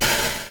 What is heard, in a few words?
GATED PLACE, Impulse Response